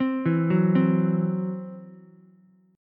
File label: E Suspended 2nd Sharp 5th, Ukulele; Esus2#5 arpeggiated